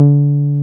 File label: 303, TB-303 BASS